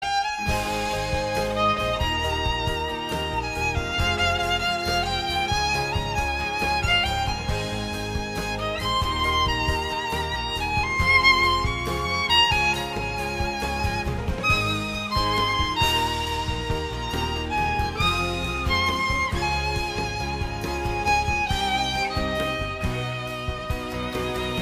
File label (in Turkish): keman zil sesi; cep telefonuna keman sesleri